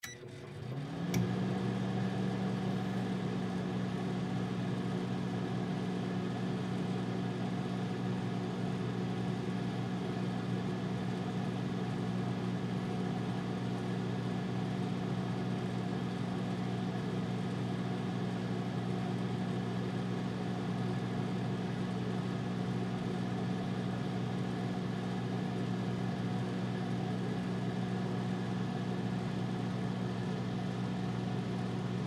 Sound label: Microwave Cook, Microwave Cook Popcorn; Household; Appliance; Microwave Doors